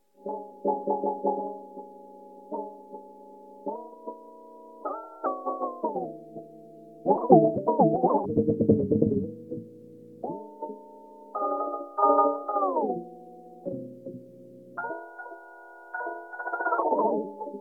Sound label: drum